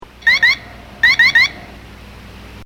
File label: Squeeks; Max Headroom; The Max Headroom Show